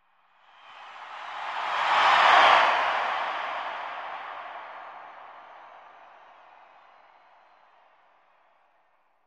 Arena Crowd; Crowd, Huge Cheer With Doppler By And Long Decay.